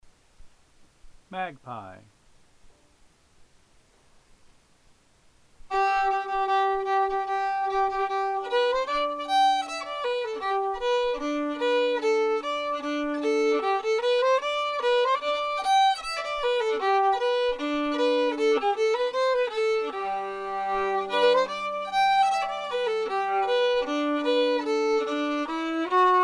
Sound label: Magpie, fiddle